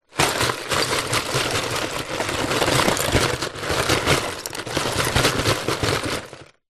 nature ice pieces small hundreds shake in plastic container